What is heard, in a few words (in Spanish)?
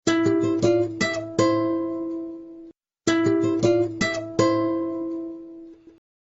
guitarra doble